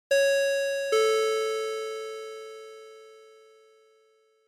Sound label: Chime